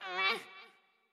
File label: Wimp Hurt